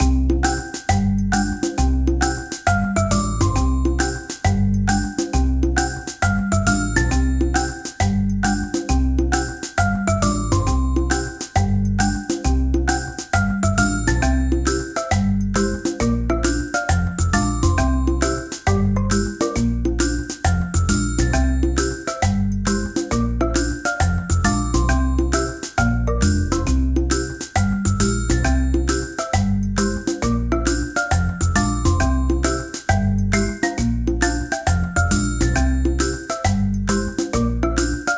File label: bgm result loop